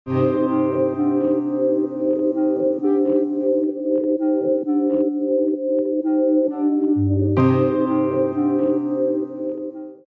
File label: round ten sec count, music